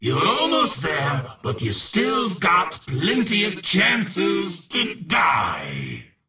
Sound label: spawn; death wish